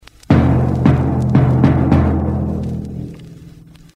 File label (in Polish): bęben duży